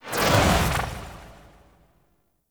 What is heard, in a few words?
UI Popup Out; Kuva Lich; Corpus Nemesis